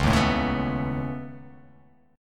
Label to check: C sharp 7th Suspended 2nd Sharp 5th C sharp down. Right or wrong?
Right